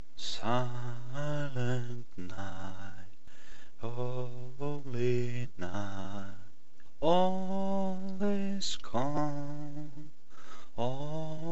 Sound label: christmas music